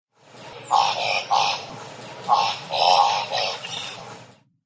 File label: Thick billed raven